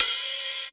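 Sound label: Closed Hi-Hat, Dance Drums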